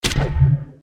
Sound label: Arrow